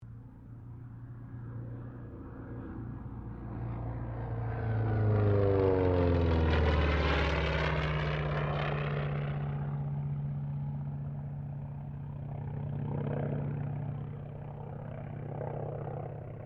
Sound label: AIRCRAFT T-28D TROJAN PASS; Airplane; Airplanes; Vehicles